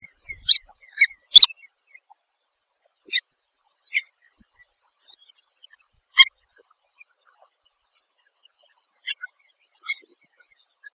gaviotin negro; Chlidonias niger

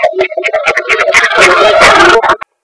paloma antartica

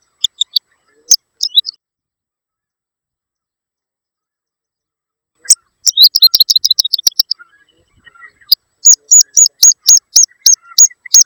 tiqui tiqui común; piojito común

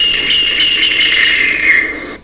picapalo chico

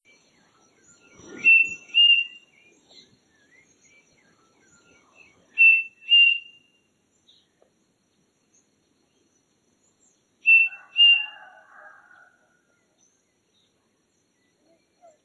crespin; Tapera naevia

